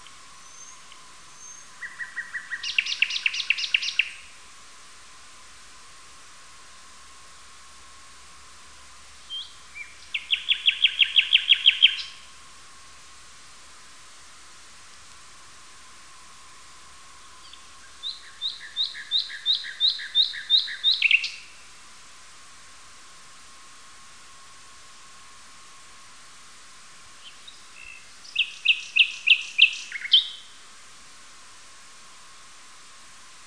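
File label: common nightingale, Luscinia megarhynchos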